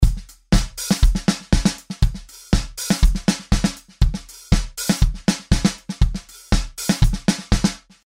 das unbearbeitete Drumkit; Akustik-Drumset; Steinberg Groove Agent 4

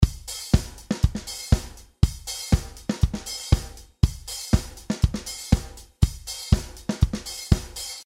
Acoutic Agent Rock Kit, Pattern Disco Pop; Pad für Verse/Chorus (Gis-1); Steinberg Groove Agent 4

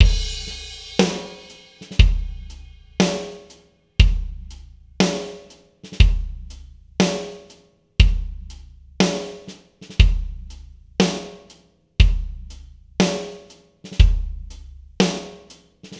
der trockene Groove, Drums; Gate-Reverb Drums; Eventide Tverb; Toontracks EZdrummer, Post Rock EZX; Snare

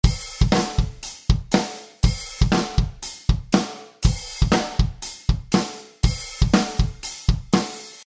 Hi-Hat-Modulation; Steinberg Groove Agent 4

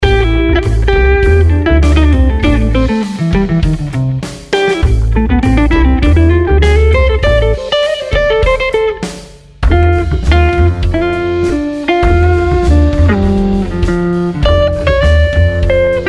Gitarre, Preset „Rock Heavy Phasey“; Waves Abbey Road Studios Reel ADT

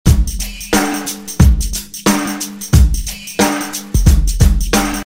Originalbeat; mit einem Step-Sequenzer moduliert; Native Instruments Molekular; Spectral Shift

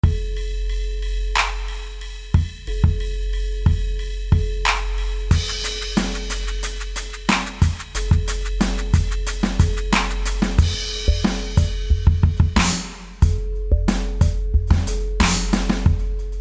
Variation der Trip-Hop-Vorlage; Steinberg Groove Agent 4; Cubase 7; Trip-Hop-Vorlage „Orpheus“

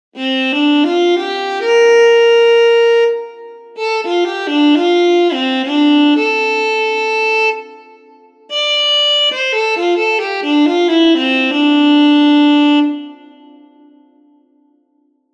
Violine, zunächst den Raum mit minimaler Diffusion, dann mit maximaler; Eventide Tverb; Samplemodeling

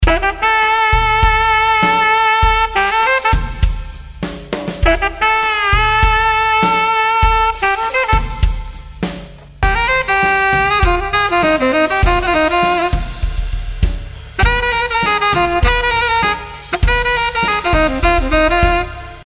Saxofon, Bassloops; Waves Abbey Road Studios Reel ADT; Ueberschall Upright Bass

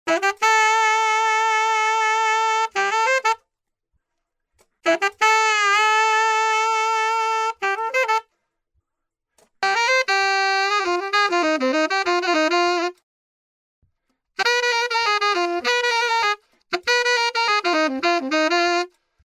ein Saxophon, unbearbeitet; Saxophone; Waves Abbey Road Studios Reel ADT; Ueberschall Saxophone

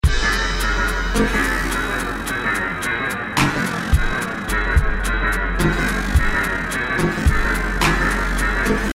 aus einer harmlosen Beatbox wahrlich geisterhafte Klänge; Formantenkorrektur; Steinberg Groove Agent 4

